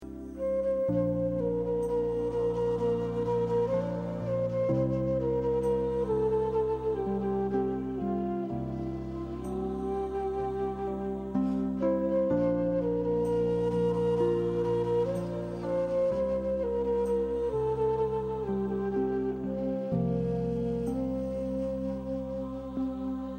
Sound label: bamboo, flute